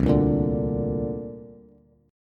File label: C 7th Suspended 2nd down Bass; C7sus2 strummed; JGuitar